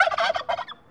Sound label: Turkey Gobble